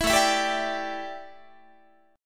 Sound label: A 7th Suspended 4th, A, down, Guitar